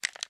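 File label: pistol reload empty mags movement; gmod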